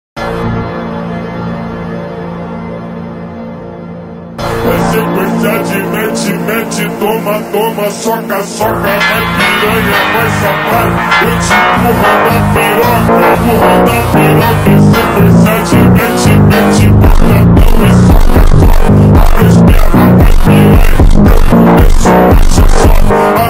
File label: slowed reverb; sukuna domain expansion